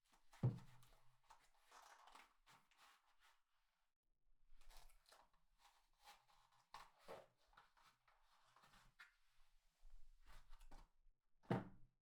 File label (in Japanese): パンプスを脱ぐ; KU100; 色々な動作